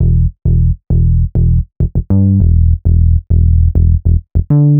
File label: HipHop Synthbass Emaj, Hip Hop Bass Synthbass loop; Digidesign; Pro Tools Essential Loops